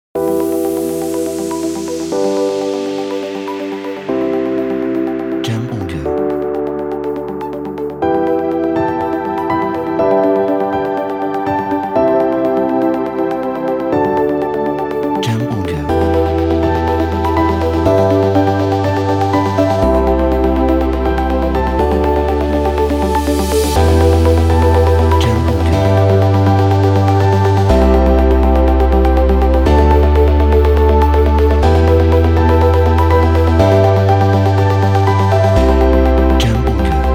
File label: Ambient Corporate